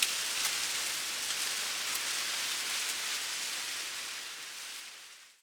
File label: plant wither slow